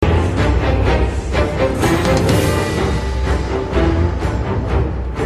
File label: Game Tune